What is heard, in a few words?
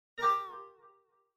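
low battery, phone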